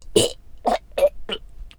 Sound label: Dying